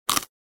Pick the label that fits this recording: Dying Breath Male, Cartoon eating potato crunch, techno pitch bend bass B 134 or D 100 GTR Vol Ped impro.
Cartoon eating potato crunch